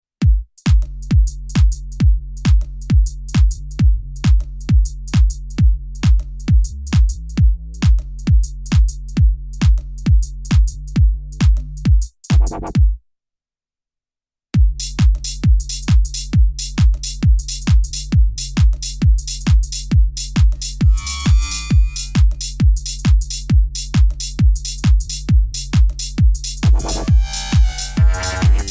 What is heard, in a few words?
Polyend Play Bass